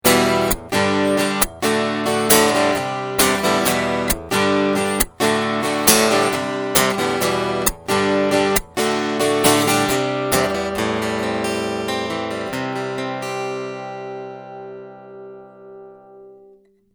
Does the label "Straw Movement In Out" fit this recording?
No